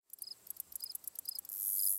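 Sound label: Pets Insect Large Idle; creatures pets; Lego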